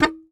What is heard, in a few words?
Very Short Note, Melodica